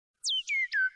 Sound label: sweet sms bird; birds ringtones